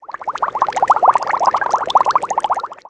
boiling

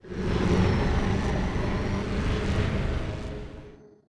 TELEPORT; mahlendur

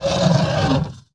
attack; mahlendur

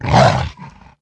attack; mahlendur

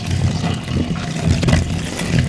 STONE LOOP

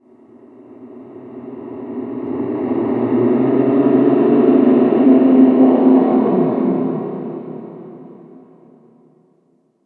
ambient scream

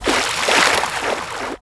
SWIM DIVE DOWN

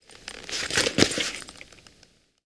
backpack apply

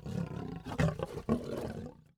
WARN

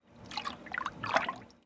alchemy shake liquid

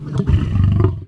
ambient

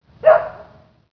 dog

